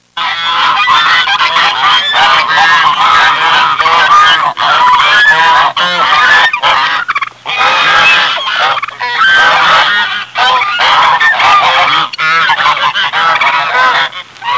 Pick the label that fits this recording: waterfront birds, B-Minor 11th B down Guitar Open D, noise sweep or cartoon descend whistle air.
waterfront birds